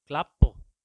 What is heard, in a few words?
gloepfer